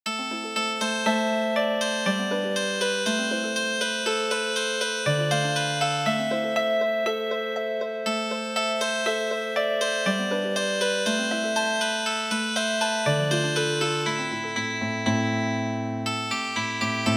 Healing Harp; Qazan March

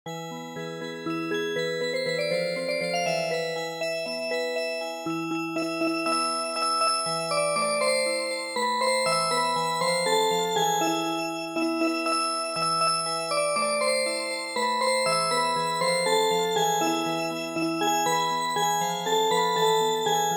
Music Box, traditional; ElVito

